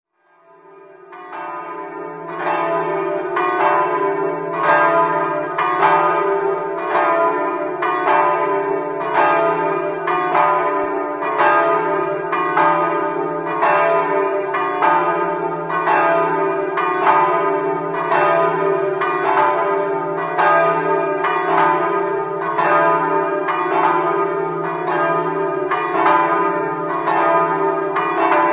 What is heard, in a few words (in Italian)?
cavazzo, campane